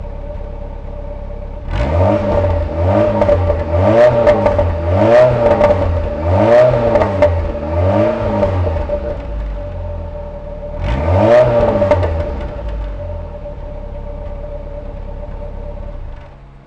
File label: GTI 1600